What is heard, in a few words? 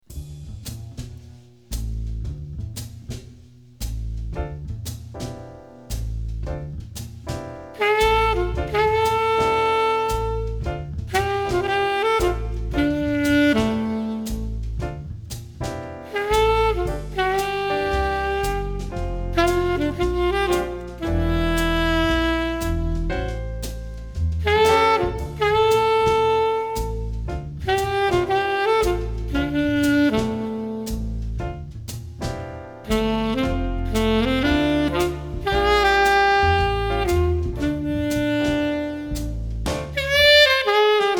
Saxophonist